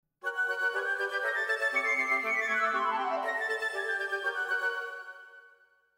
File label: Brook